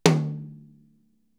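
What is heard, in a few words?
mid tom rimshot; drum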